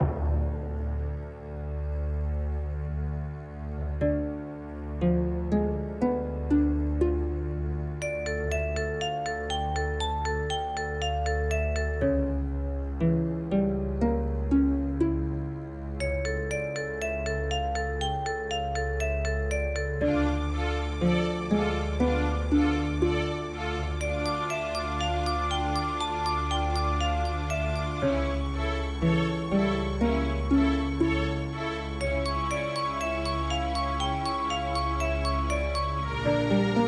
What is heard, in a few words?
misc dungeon